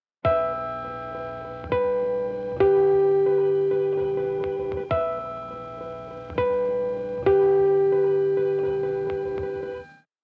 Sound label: intro drones stem